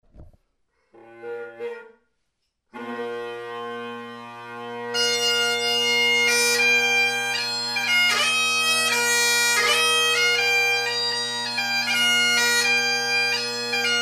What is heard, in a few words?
6-8 March, bagpipes